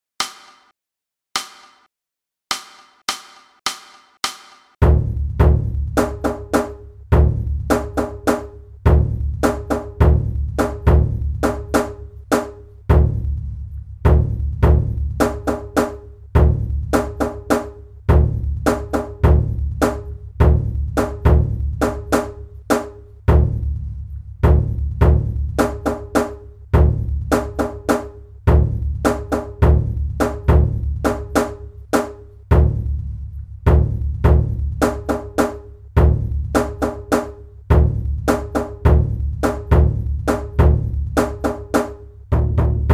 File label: taiko; 十日恵比寿; kasadera hayashi; tookaebisu